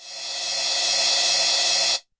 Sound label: Rev Cymbal, YAMAHA RM1X